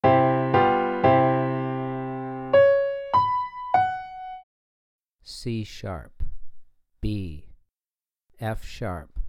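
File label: Piano Major Interval c#4 b4 f#4, three note melodic; ear training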